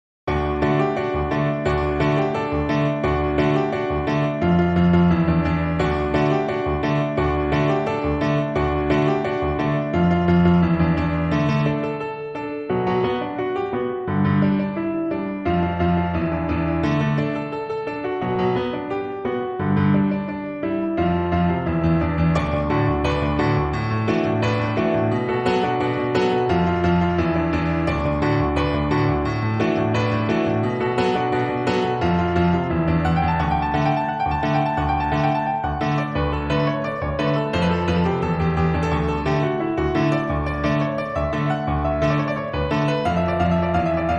(Piano)

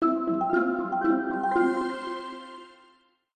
Minigame Drawing; MLP Friendship is Magic (Mobile Game); My Little Pony OST Repository

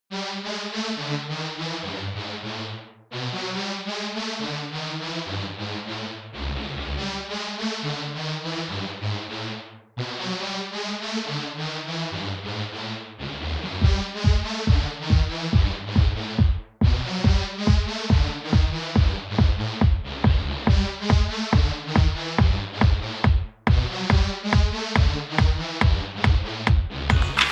Flugelhorn; Synth Rainboom

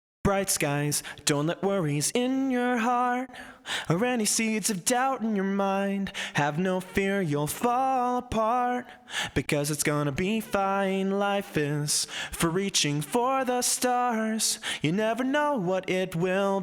Vocals; Pony Music Archive; Double Rainboom

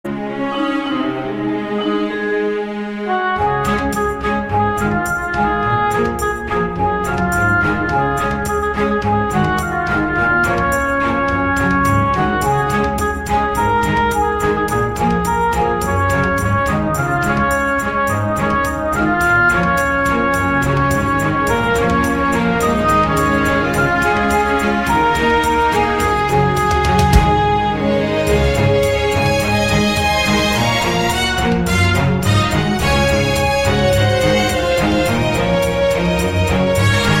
Orchestral Remix

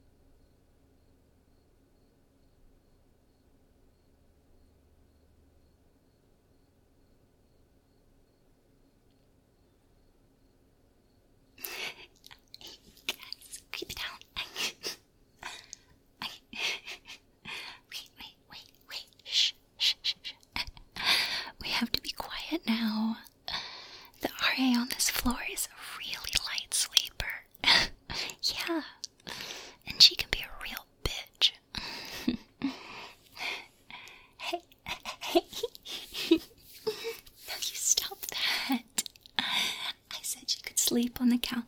F4M ASMR Ear Kisses Mouth Noises Licking Whispers; Hold the Moan; We Have To Keep Quiet

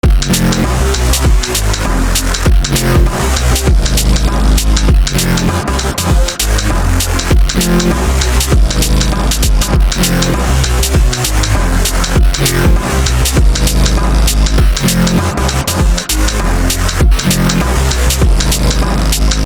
SOME GLITCH HOP

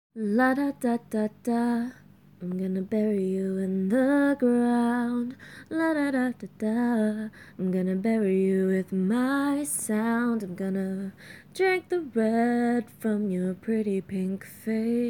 song cover, voice, a capella

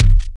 Monster; Zenless Zone Zero

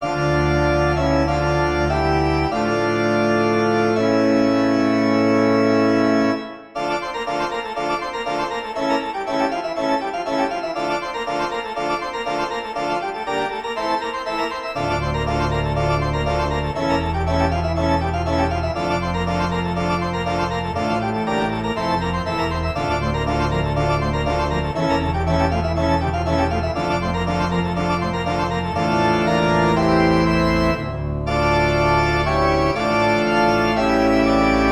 Toccata and Fugue in D minor, Organ

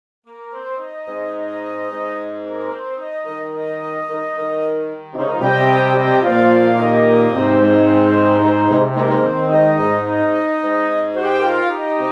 arr. for concert band

